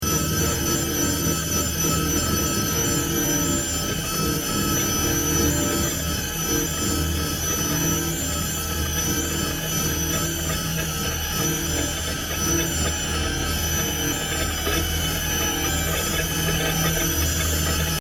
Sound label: siren